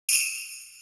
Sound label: suzu